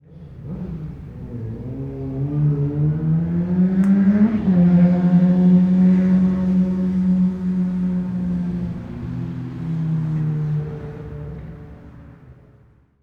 Auto Or Motorcycle Engine Full Throttle Rev Distant Pass Big Echo Ominous